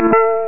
End bonus

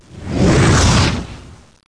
spaceship; ULTRA GAMEPLAYERS - Disc 104 - Dec. 1997

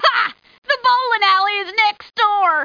D4 BOWL, POOL CHAMP

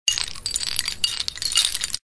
Dinner Chimes; Survivor The Interactive Game

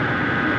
CAM MOVE; moonchld

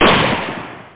GUN; vcop; E-GUN

